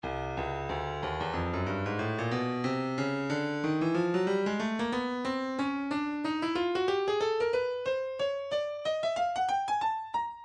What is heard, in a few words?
breakbeat midi files 092 093 bpm; Stylus